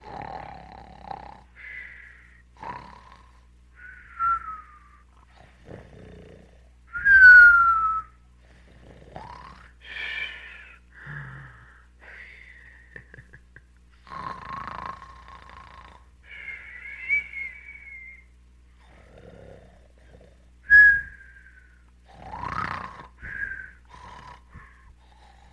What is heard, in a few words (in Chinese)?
一个男人打鼾吹口哨的呼噜声音